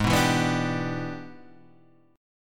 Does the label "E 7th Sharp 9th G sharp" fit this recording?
Yes